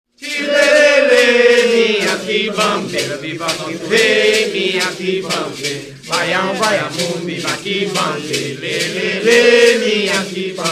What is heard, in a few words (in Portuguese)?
Baiao